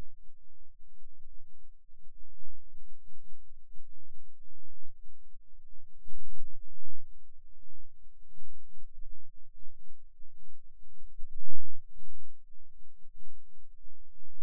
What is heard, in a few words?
Rhythm Wave, eeg seizures